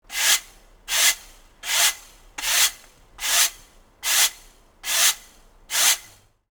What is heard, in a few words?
broom sweeps; cleaning